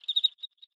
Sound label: Env Void Insect Sing; viewfinder